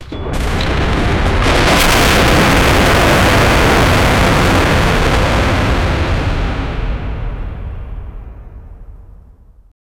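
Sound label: a massive skyscraper collapse; apocalypse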